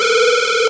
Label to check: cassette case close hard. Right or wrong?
Wrong